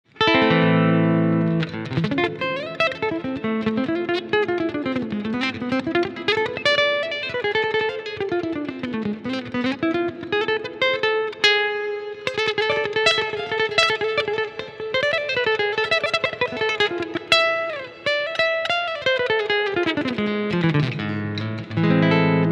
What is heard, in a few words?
Fender Japan; STCL-100-YNG